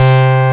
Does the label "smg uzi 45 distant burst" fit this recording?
No